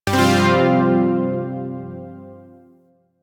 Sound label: Shawzin Pent Min Chord G; Chords; Warframe; Zariman